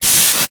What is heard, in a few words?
Aerosol Can, Bathroom; 1001 Sound Effects